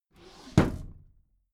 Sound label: desk drawer close heavy